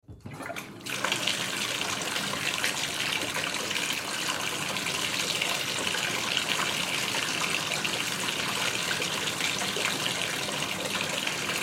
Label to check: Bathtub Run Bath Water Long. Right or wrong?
Right